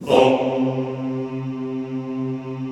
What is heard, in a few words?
Classical Choir Hmmm C2; Best Service; Extended Classical Choir